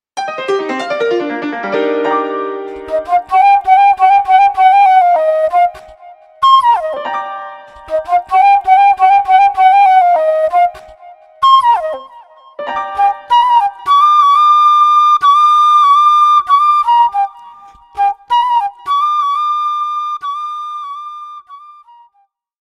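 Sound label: flute and piano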